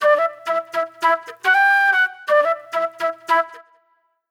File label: Fusion Flute; Sonic Foundry (Sony Creative Software); Manhattan Flutes; Garage Funk